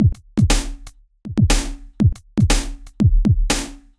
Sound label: Hybrid Scoring Rack; Ableton; DM-307A Free Pack by Heavyocity; Hybrid Cinematic